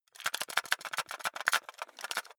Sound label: cassette shake long